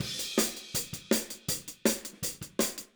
Hi-Hat, Drums, 162 BPM; Take Your Toms Off

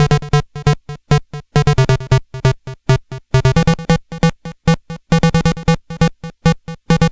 Game Boy Bass FX; 8-Bit; Chiptune; Nintendo

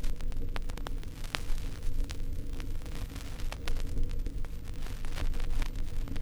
Vinyl, noise

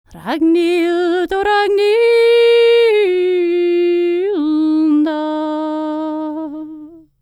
Nord, World Voices; ILIO; Vocal Planet VOL-5

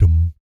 BS DMM, Vocal Planet Jazz & FX; ILIO; BASS DUMMS

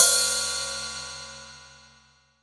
PS2002 HH, PS 2002 HH

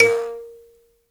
Buzz Kalimba; Roland LCDP11 Africa VOL-1

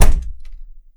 Door, household rhythm samples Hits

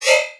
synth hit yoink factory, D#; Virtual Riot

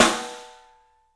STEEL S, drums; 3D Sound

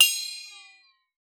WATER, PERC; Xsample VOL-9

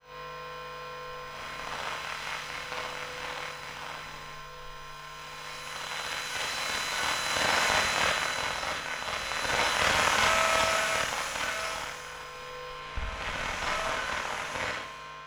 Electromagnetic Fields, Buzzin; electric fields

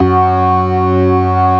STOPS, organ combos

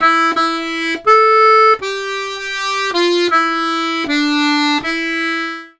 Complete Accordions; USB Soundscan vol.40; AKAI

